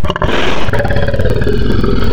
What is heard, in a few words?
Pig, Animals Wavetables; Cymatics; Serum; Animals for Serum - Gold Edition